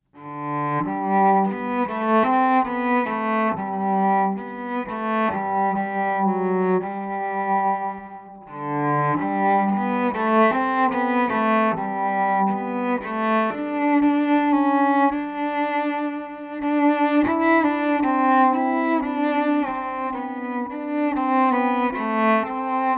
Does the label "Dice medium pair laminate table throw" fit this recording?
No